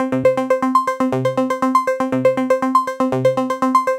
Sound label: Auto Arpeggio; Dr. Fink's Funk Factory